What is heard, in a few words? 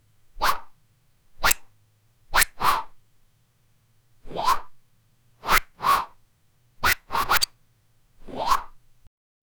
human zero smile servo mo, robotics